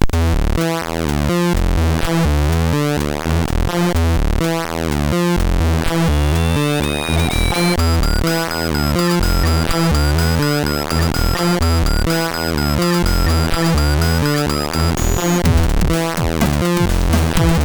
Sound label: Noise 2, MOS6581R4